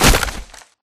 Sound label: spectre blind fire grunt; Titanfall 2